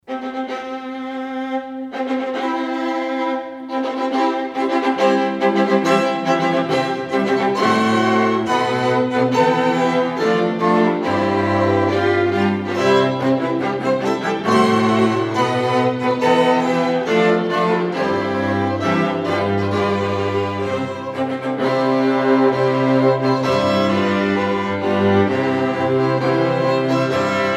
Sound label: strings